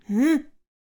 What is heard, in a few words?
attack vocals; Blowdarter